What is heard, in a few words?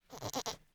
billy goat